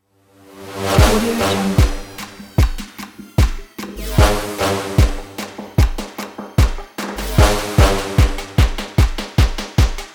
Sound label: rough trap banger